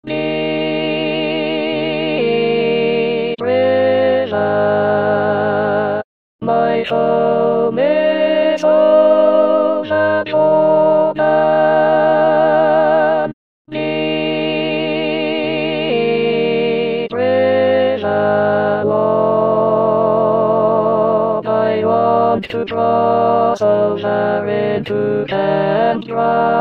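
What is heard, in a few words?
alto; Deep river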